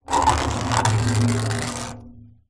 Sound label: foley metal spike small scratch rusty metal trailer panel; zapsplat